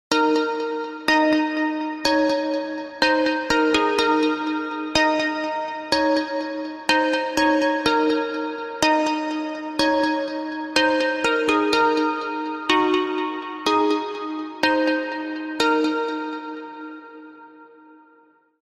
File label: i phone 3 zen music ringtone